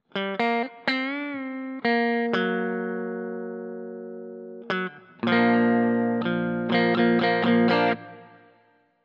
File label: bridge sc neck sc